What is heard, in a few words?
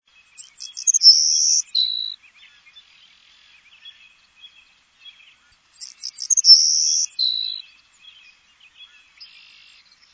Savannah Sparrow